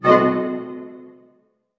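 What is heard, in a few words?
harp hit F# diminished; Sound On Sound; Peter Siedleczek's Orchestra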